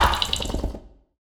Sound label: Glitch